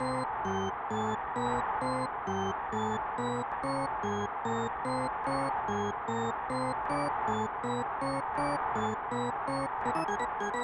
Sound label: Organ Theme